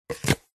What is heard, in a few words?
foley plastic cones stack together; zapsplat